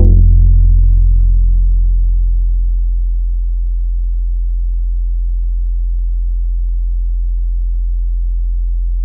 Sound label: Glass; Synthetic's Serum Collection; HYPERBITS Core Tables